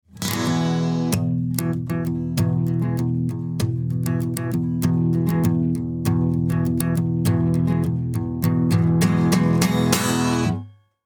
e コード

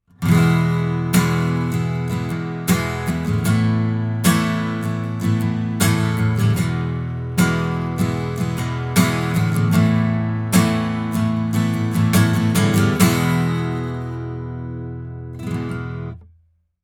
ギター ストローク; TLM102

